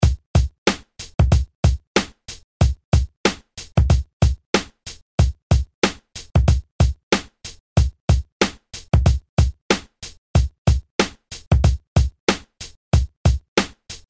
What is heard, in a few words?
drums